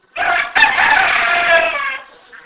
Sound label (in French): coq